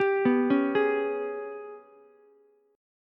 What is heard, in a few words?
G sharp Minor Major 7th Double Flat 5th, Ukulele; arpeggiated; JGuitar